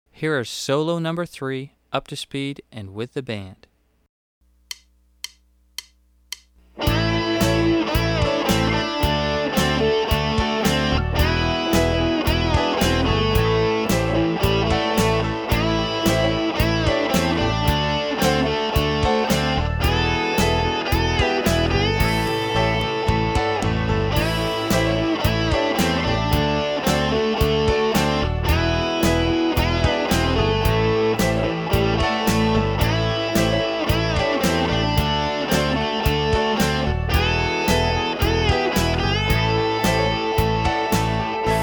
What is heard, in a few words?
Solo Fast